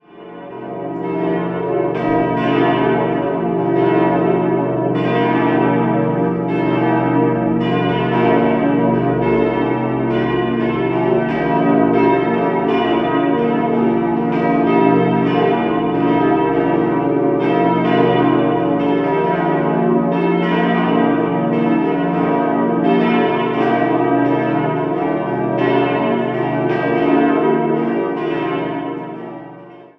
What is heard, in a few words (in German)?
glocken; Augsburg Johannes ev